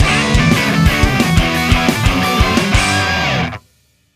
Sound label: Mission Complete Sting; rogue aces